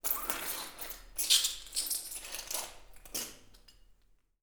Glass Scrape; 99Sounds; The Warehouse

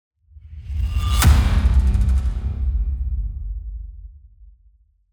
Impacts DESIGNED; SoundBits; Just Impacts

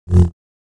Saber; FXHome

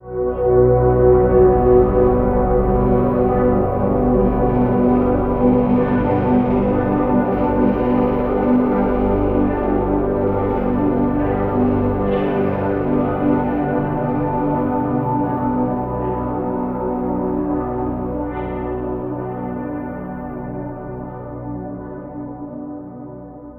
eternity synth texture melodic phrase; Ethereal Ambient; BluezoneCorp

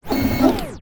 Broken Robot Servo Short Falling Pitch; Sound Spark LLC

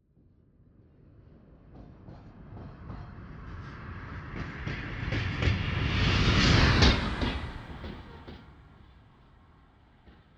train pass by, DOPPLER; Chupapsound; DOPPLER FX

